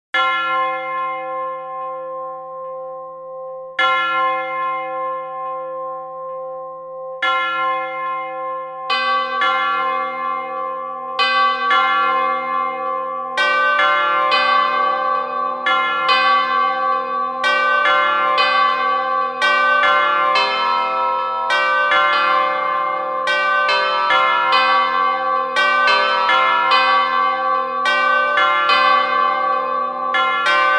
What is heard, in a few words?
tower bell